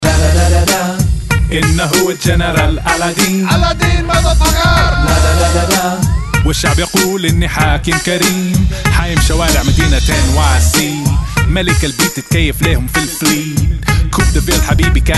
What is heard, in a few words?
win